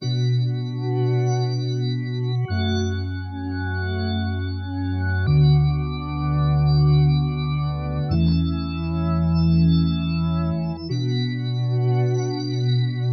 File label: Lowrey Theatre Organ